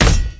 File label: basic attack; LoL; tryndamere